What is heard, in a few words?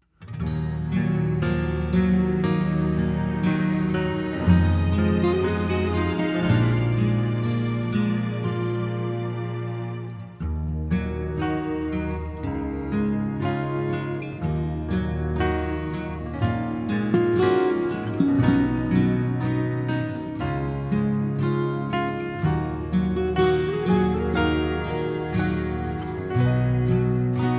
karaoke; Jesus Prayer